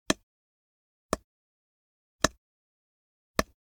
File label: Generic Button Wood Medium; boom library; The Interface